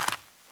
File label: Gravel; blindside